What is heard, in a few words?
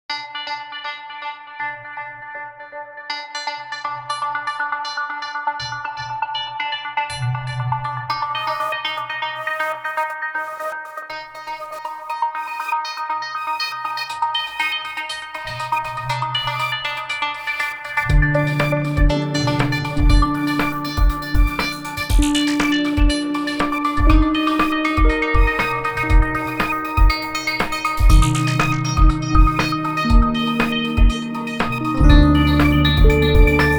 Electronic Thriller; 미스테리 리듬